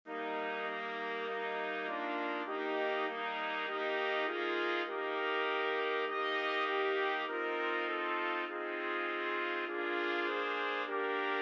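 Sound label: 4 trumpets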